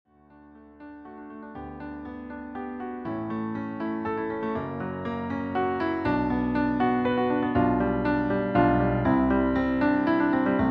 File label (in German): Klavierversion Fluegel Playback Pianoversion